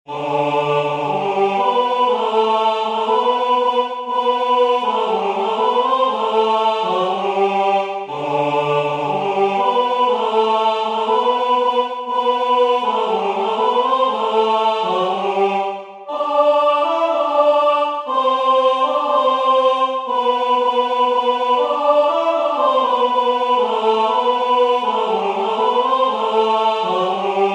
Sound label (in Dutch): DOOR DE LIEFDE LAAT U WEKKEN 1stemmig